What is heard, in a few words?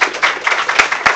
APPLAUSE; CMI Sound Library Rev 1.3